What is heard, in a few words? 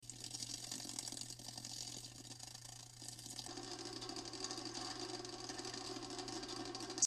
beetle